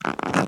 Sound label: Hub-Train Strap Squeak